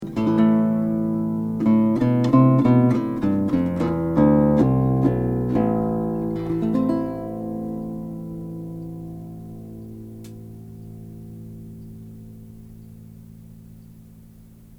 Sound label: Bass